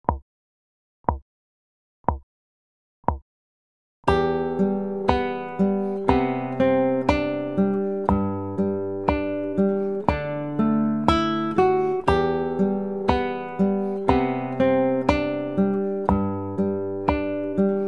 melodie picking

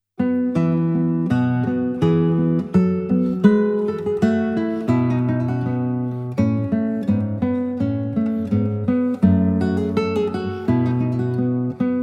gavotte

